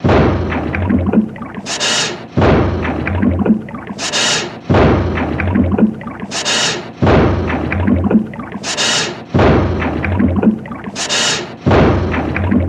Sinus headache, pounding machine and liquid, sounds like pain in head